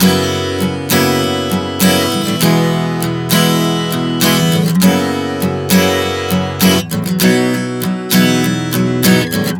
F#-E-F#-B 100 bpm, acoustic guitar chord progressions